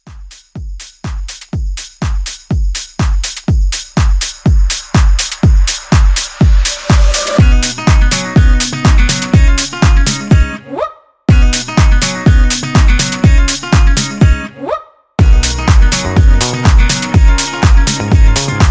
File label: Pop Re Drum 123